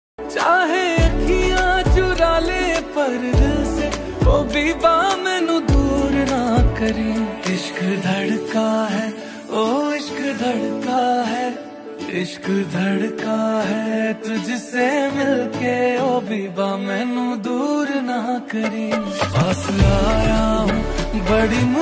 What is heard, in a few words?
hindi ringtone